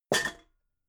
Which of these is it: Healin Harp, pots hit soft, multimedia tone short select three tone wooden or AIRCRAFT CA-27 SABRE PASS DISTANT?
pots hit soft